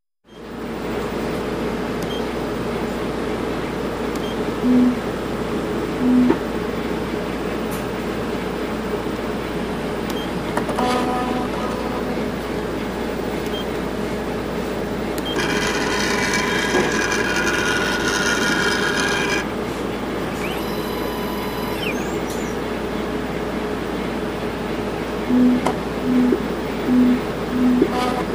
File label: Blood Analyzer Motor